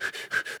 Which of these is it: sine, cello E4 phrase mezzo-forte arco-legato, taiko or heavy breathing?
heavy breathing